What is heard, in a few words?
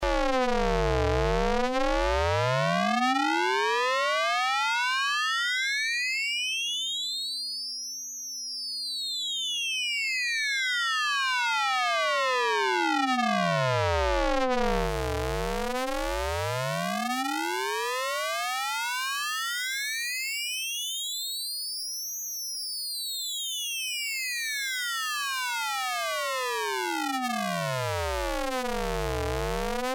FS Ring Mod Compare, Frequency Shifter; SergeModular; Frequency Shifter Samples